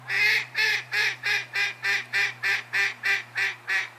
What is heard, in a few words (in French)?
Canard